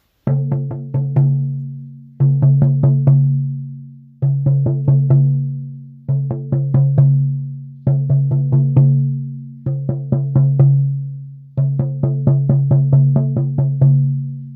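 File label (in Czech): samansky buben 40 cm, hromovy ptak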